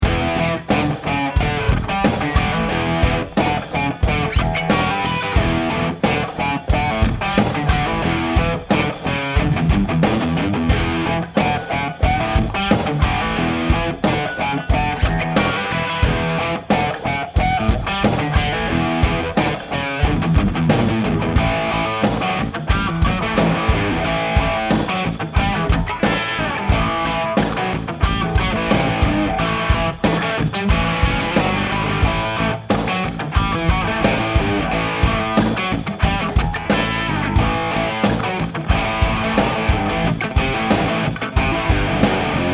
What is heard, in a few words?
Crunch